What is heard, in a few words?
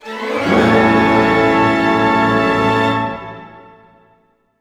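glissando A major, orchestra; Sound On Sound - Peter Siedleczek's Orchestra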